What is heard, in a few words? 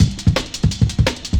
170, Breaks; Drum&Bass; EZ Rollers